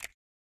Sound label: button Hover; knight rider